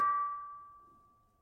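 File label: Panerus D6, gravity harps tuned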